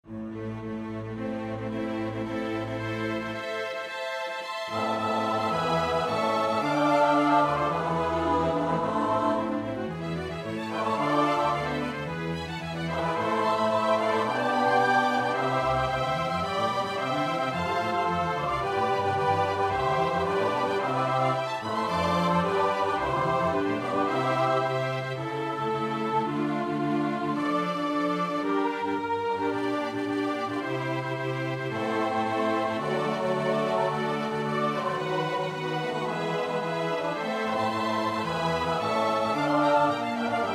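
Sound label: soprano alto tenor bass; Händel (Georg Fredric ); Samson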